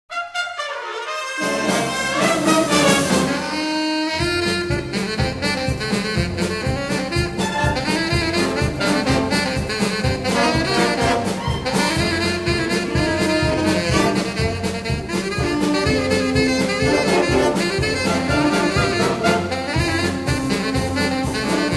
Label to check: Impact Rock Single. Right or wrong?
Wrong